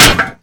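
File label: destroy fire extinguisher; Explosions; Call of Duty Modern Warfare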